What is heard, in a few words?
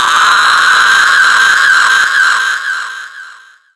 Siren Scream; zombie plague